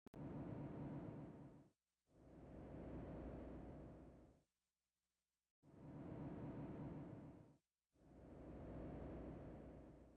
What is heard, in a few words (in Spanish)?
Murmullo vesicular; Síndromes respiratorios